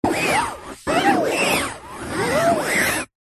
foley fabric pointed object scrapes fabric over hard surface whizzing sound; zapsplat